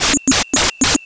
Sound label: WRONG, SIGNALS; AKAI Anime Sound FX